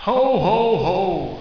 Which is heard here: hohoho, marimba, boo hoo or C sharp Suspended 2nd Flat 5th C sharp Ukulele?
hohoho